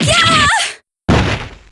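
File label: super damage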